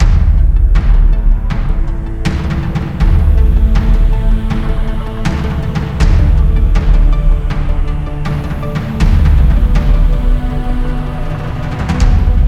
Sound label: COMBAT TENSION